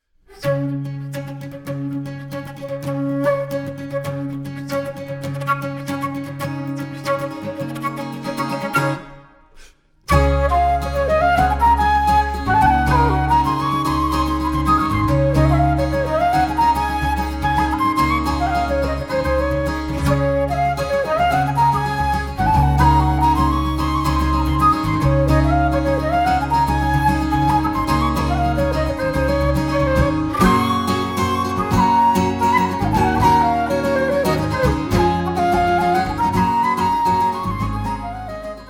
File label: polska jigs